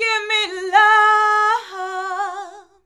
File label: GIMME LOVE, FEMALES, Hyper Dance